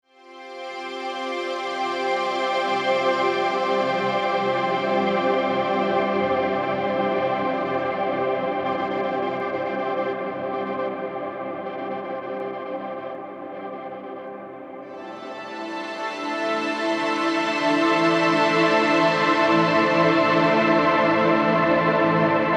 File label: Pentatonic Clusters